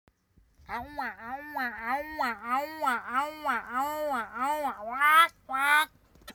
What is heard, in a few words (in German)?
Aua